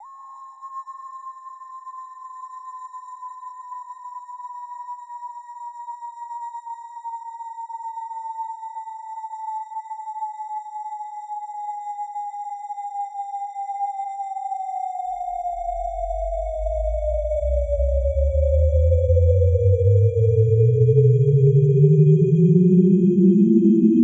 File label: 128BPM Spooky; FX Fills; Vengeance Samples